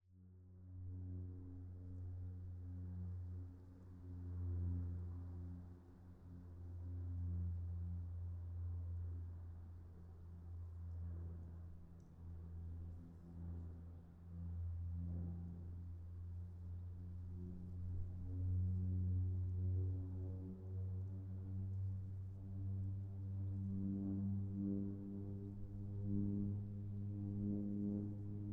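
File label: Cessna 208 - EXTERIOR - BY - Fly By; Cessna 208 Caravan 1995; Pole Position Production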